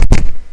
land, player

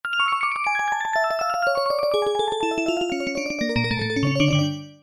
synth piano drop

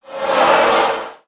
screech

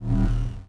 energy footsteps

